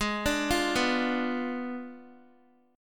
G sharp Diminished 7th G sharp, Guitar; G#dim7 arpeggiated; x,11,12,10,11,x

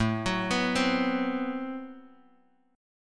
A Minor 6th Add 9th A Bass Standard, Chord; Am6add9 arpeggiated; 17,21,21,17; JGuitar

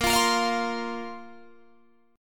A sharp Suspended 2nd down Guitar DADGAD

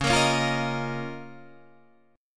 Db 6th Add 9th Db, down, Guitar Open D

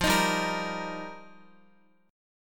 F 7th Suspended 2nd Suspended 4th, Guitar; F7sus2sus4; strummed; x,x,5,8,7,6

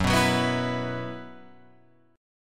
Gb 7th Suspended 4th, Guitar Open F; strummed; 6,6,6,6,7,6; JGuitar

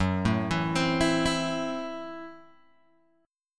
Gb Minor 7th Flat 5th, Guitar Open G

